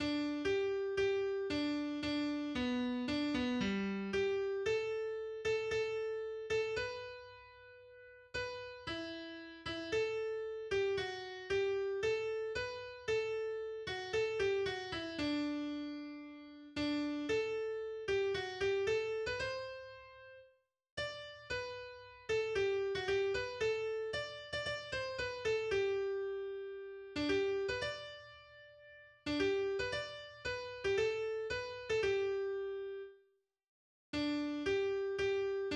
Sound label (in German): Klavier